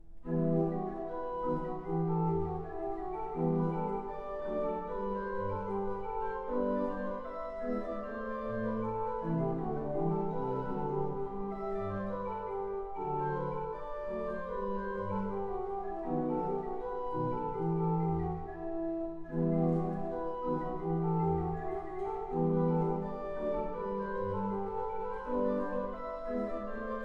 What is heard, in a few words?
Partita; Ach, was soll ich Sünder machen, BWV 770 - Partita III; J.S. Bach Chorale Partitas, BWV 766-768 & 770